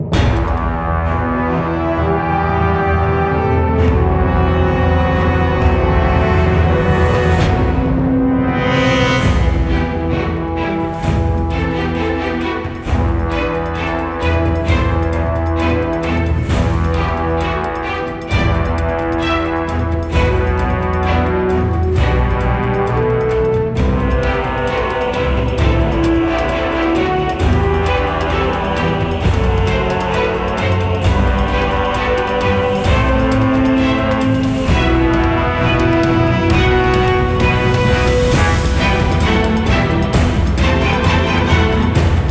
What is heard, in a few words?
Orch; Crysis; Pyrrhic Victory